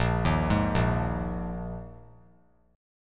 Bb 7th Suspended 4th Bb 3C,3F,3A,3D; arpeggiated; JGuitar

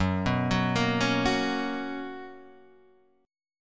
B Major 7th Suspended 2nd F sharp

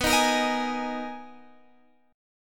B Major 13th B down Guitar Half Step Down

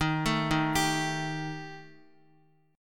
D sharp Major Flat 5th D sharp Guitar, chord; D#Mb5 arpeggiated; x,x,3,2,3,3; 4F,4A,5C,5G,5C,6E; JGuitar

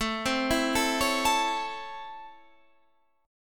C sharp Minor Major 7th Sharp 5th, chord

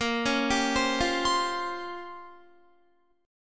Bb Minor 6th Add 9th Bb down Guitar; Bbm6add9 arpeggiated

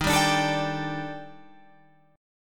D sharp Major Sharp 11th, Guitar, Open F; D#M#11; strummed

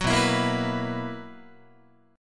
B Major 7th Suspended 4th B, Guitar; strummed; 9,7,7,8,8,10

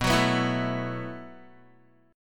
B 7th Suspended 4th B, Guitar; B7sus4; strummed

